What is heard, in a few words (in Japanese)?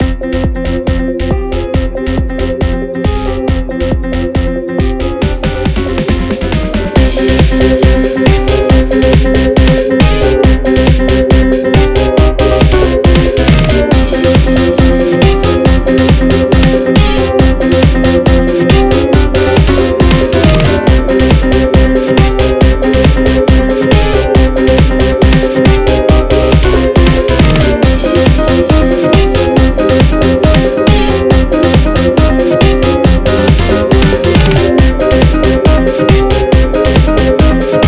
異形 Loop; 音楽素材; 荒廃した都市、紅く染まった空、そして異形の巨大生物。 それはまさにディストピアだった。